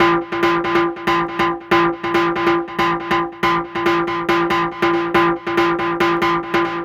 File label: NRG Lead, trance